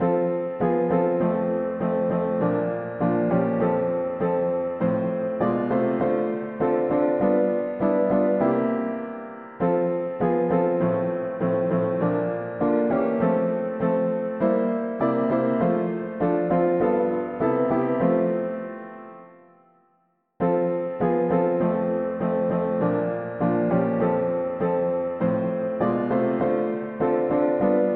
mono piano; hymns

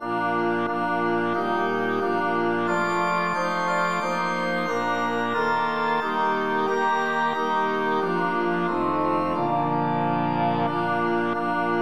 Love divine all praise excelling polyinstru; hymns

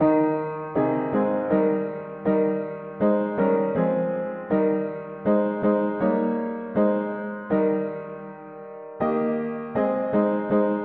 mono piano, hymns; Well may we sing

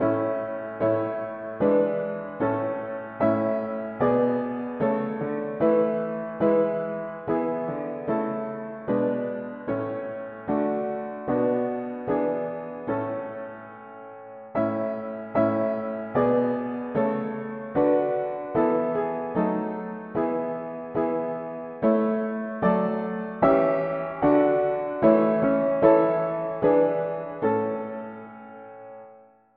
mono piano, hymns; Vers toi Jesus vers ma patrie

